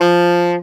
A SAX POR F2, Alto Sax Portato; Orchestral; VarazdinOrchestral

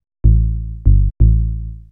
HOUSE BASS 125 A

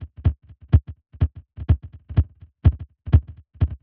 125 Grooves, Percussion; Loops; Percussion (Mono); Touch Loops; ElementsOne; AbstractRhythms

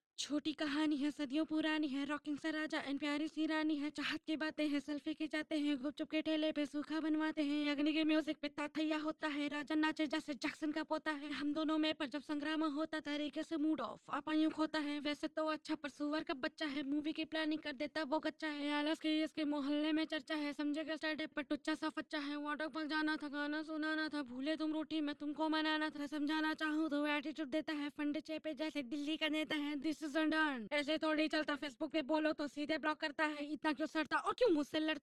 Harmony

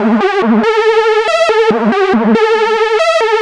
TECHNO SYNTH 140; Keys

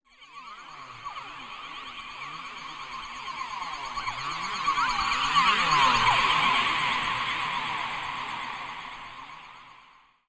TECHNO FX 140

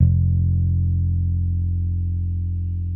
BASS F1, ELECTRICBASS

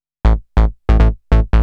TECHNO BASS 140 A

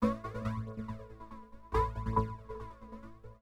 TEKNO 140; Techno2

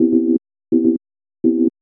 house synth 125 A, keys

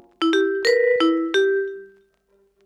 PIANO 90 A; old rec

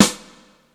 HOLZSNARE, snare drum; SNAREDRUMS

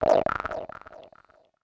FX SHOT TEKNO 140; Techno2; Singleshots

